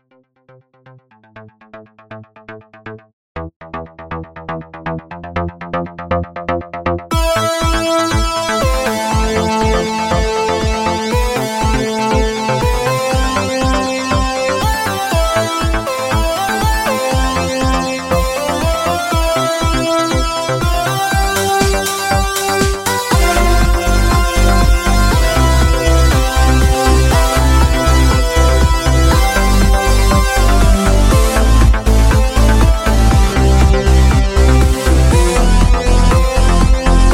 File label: trance mix